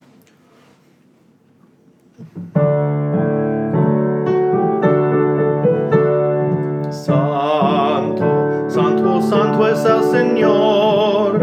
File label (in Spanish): santo